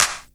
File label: DIRECT CLAP; Q Up Arts; Dream Experience